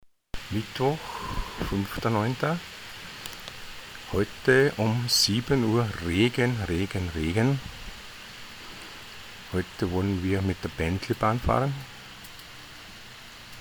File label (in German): Regen